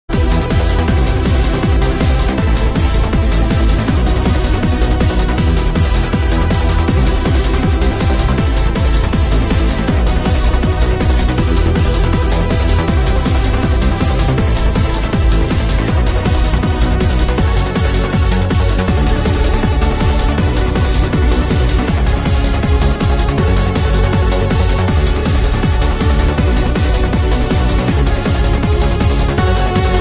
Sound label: Trance Remix; Hawkeye